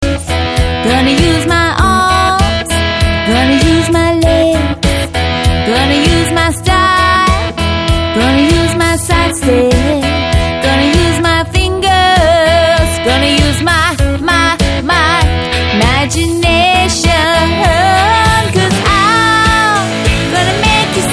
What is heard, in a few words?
Steel Strings